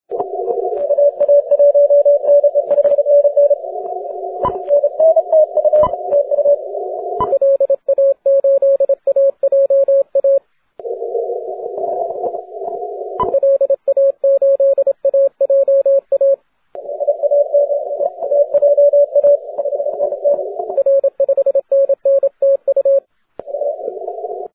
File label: 40m cw